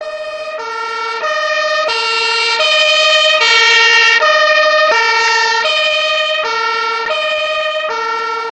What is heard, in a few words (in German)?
Feuerwehr Deutschland